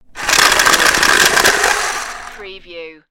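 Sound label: Card Shuffler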